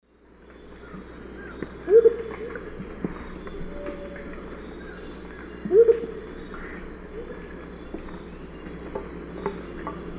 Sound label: Momotus momota, hoot song; S. America; Eastern Lowlands